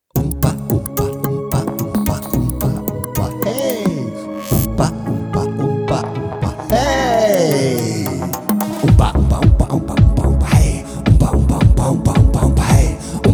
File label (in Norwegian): barnesang; OMPA OMPA